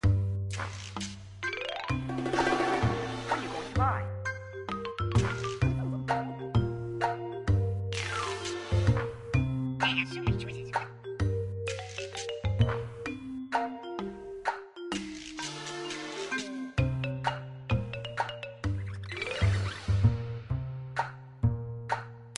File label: music menu screen; Shopmania